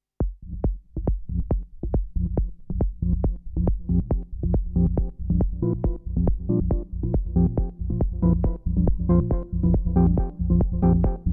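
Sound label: Moog Sound Studio Patch Subharmonicon DFAM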